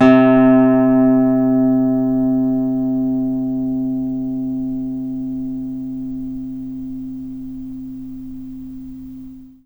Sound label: NYLON GTR